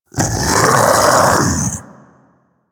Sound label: Infested Splitter Split Vocal Alerted; Warframe; Grey Strain